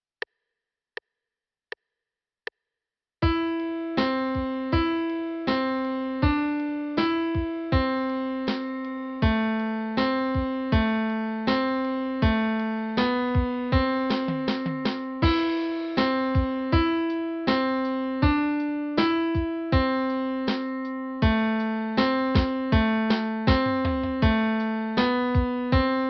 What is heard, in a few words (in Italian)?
ascolto 3 note